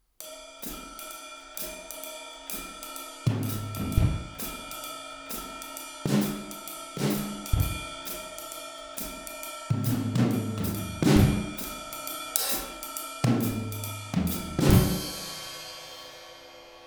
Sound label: 4 Piece 1 Mics, drum set